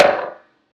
perc flange; Drum Sample Pack; One Shots; Slate; SEISMIC

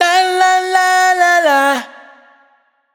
riff La La La Bbm wet, vocal; Slate Digital Omnivox Vocal Sample Pack

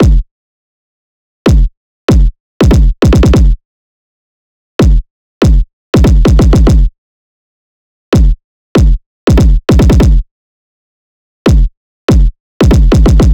kick 144, Kick Loops; drum sample pack; Ibu; SEISMIC; Slate

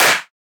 clap zap, One Shots, Claps; Drum Sample Pack; Slate; SEISMIC

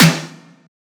snare bump; Drum One Shots; SD MIDNIGHT Sample Pack; Slate

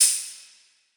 cymbal splash; Hip-Hop; Drum One Shots; SD CAICOS Hip-Hop Sample Pack; Slate

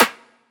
clap one shot; Pop; Drums; Slate; SD PASADENA Pop Sample Pack

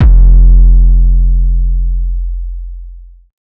808 one shot F; Slate Digital BASSHOLE Sample Pack

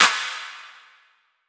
Clap, 808 Claps; Slate; Vintage Drum Machines Volume 2 808 Edition

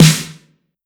909 Snare

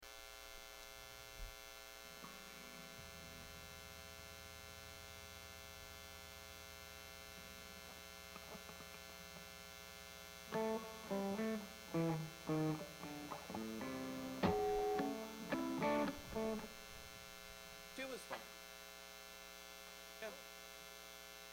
Buzz and Feedback Mix

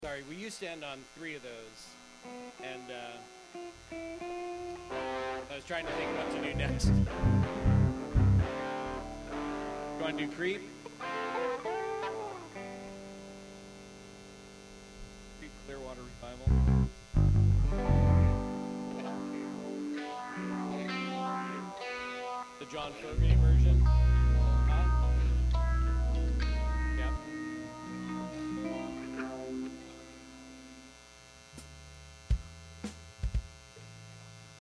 Buzz and Feedback Mix

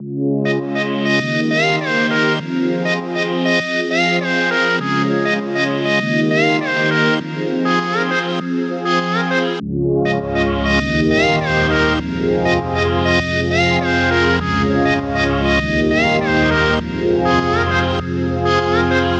songstarter 100 Fm; Slate; SD PASADENA Pop Sample Pack

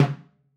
timbales Low Mute; Raw Perc One Shots; Slate; SD SEISMIC Drum Sample Pack

